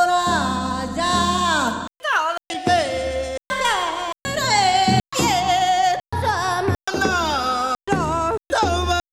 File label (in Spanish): portamento descendente